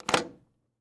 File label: lock it in; effects